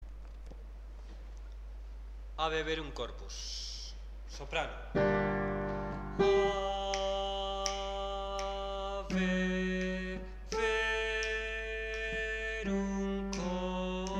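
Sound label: soprano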